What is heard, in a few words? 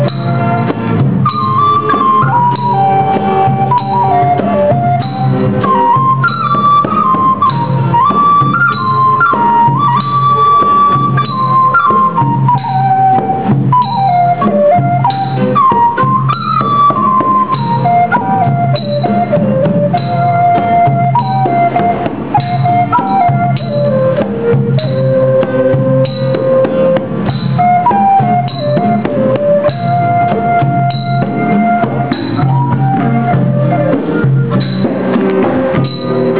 flut